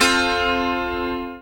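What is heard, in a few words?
ZIMBAL PIZZI; Best Service; World Colours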